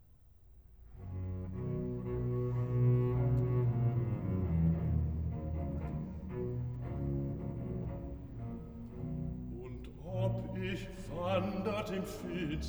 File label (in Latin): Versus III (Bass); Cantata, BWV 12 'Der Herr ist mein getreuer Hirt'; Bach; Echternach; 2nd Sun After Easter